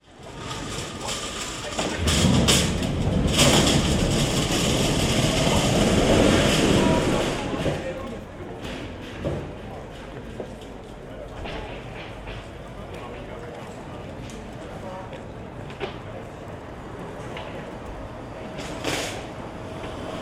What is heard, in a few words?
tesco noise